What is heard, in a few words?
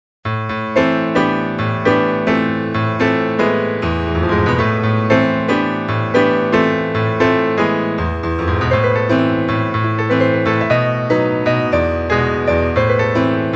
Piano; Castlevania